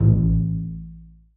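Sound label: basses pizzicato a1; Sonatina Symphonic Orchestra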